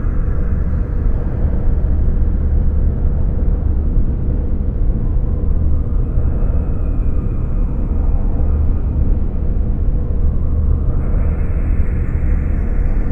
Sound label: spacebase outdoors; ambient; Garry's Mod; tf2